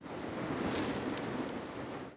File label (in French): vague; ambience